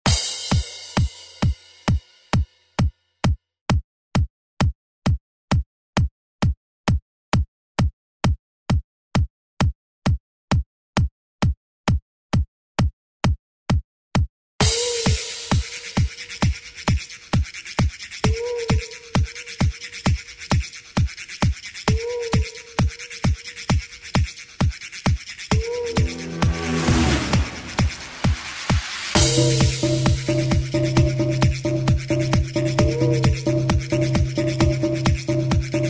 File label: 90s Club; DJ Mhark